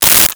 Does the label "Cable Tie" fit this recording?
Yes